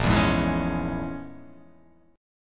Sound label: Bb Minor 7th Flat 5th Bb, Bbm7b5 chord; Bbm7b5 strummed